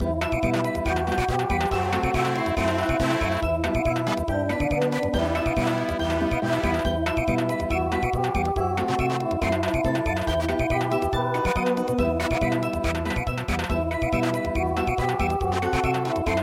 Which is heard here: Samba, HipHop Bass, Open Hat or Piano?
Samba